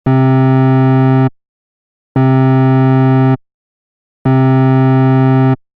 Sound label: ADSR